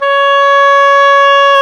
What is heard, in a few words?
oboe, Roland Orchestral Winds; Roland LCDP04 Orchestral Winds; Oboe Brth 1